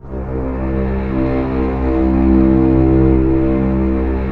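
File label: strings C1 PP L; Sound On Sound - Peter Siedleczek's Orchestra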